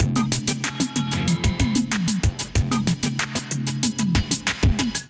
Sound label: 64 Pads Kit groove 94 bpm; MIDI Clips; Penelope; Ableton; Drum Essentials